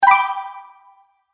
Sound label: Magic Purchase Ding; Cartoon Sound Design